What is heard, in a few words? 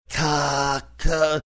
making k sounds